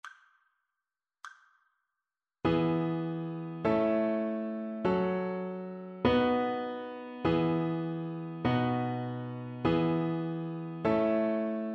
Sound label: harmonic dictation